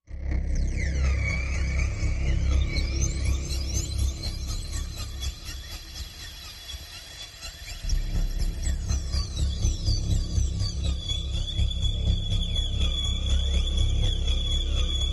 Pulse, Machine, Vibrating Energy, High Pulse; Slander Pulse; probes

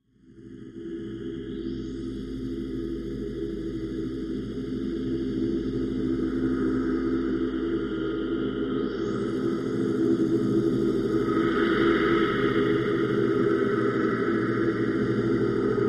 Raising Tin Machine Am, eerie ambience

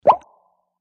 Bubble Pop Classic Pl

